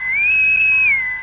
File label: Ringin; spock